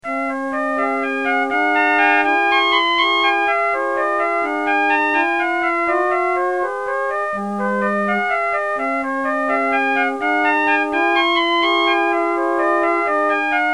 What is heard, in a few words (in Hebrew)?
שקט מעניין